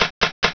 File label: cooking